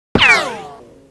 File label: ricochet; gesource; physics; impacts; ricochets